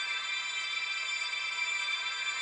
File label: count up riser; spinsane